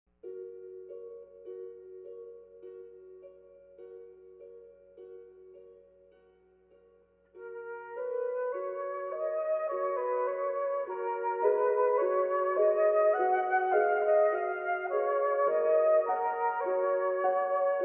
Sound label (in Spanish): arpa y flauta